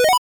switch up; itgmania

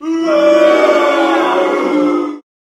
Booing; stepmania; techno; gameplay comment danger

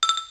Menu Timer tick; itgmania

